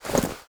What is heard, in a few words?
shove